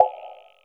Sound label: Bonk, One Shot Drums